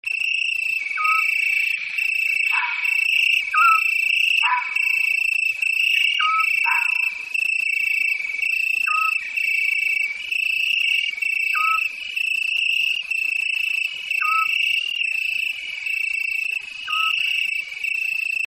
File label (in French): petit-duc et renard